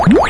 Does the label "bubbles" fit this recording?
Yes